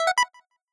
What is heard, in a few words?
button up